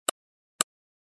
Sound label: Computer mouse long click; mouse sound effects